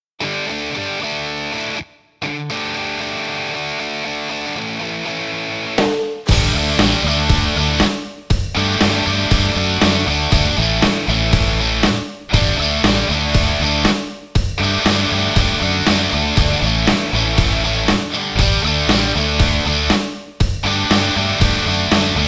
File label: Rock